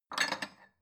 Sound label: bowl take hard